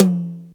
tome drum sample f key, Royality free tom drum sound tuned to the F note. Loudest frequency: 1360Hz; tom drum samples; one shots